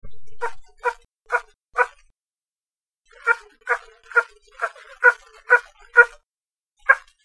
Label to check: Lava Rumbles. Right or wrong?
Wrong